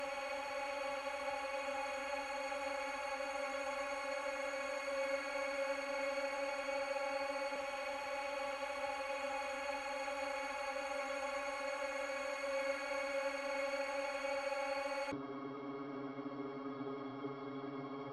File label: 127 BPM G# Min Drone; Loop Stems; Euphoria; Cymatics; GHOST (Beta Pack); Allured